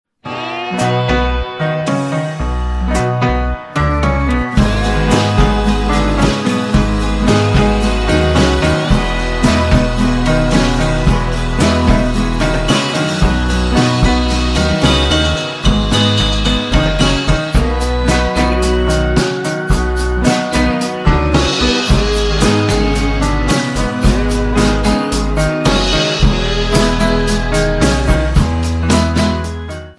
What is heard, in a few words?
instrumental